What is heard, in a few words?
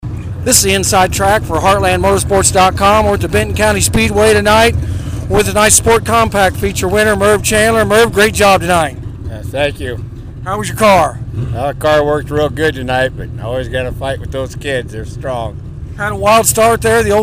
Sport Compact, Speedway; Benton County Speedway; Hawkeye Dirt